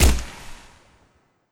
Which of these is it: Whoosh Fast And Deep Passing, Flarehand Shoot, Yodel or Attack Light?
Flarehand Shoot